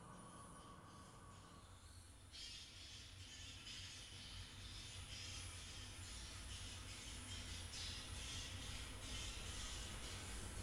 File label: Bats